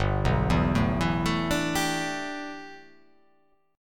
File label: G 6th Add 9th G, chord